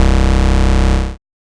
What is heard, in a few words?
Phat Sync Bass; Big Fish Audio; Old Skool House; OSH Bass